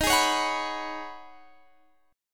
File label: Db 7th Suspended 2nd Suspended 4th